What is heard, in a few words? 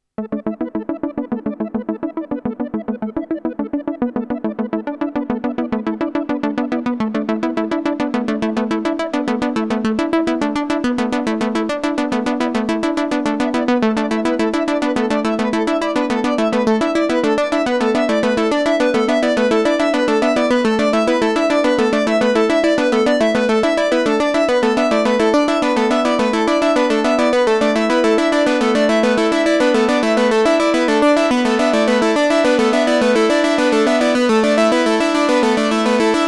Moog Sound Studio Patch Trio Mother 32 Subharmonicon